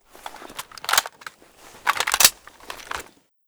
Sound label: groza reload, weapons